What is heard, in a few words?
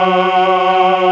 TRON MALES; E-MU Formula 4000 Series Vol. 3; Analog Odyssey; VK Instruments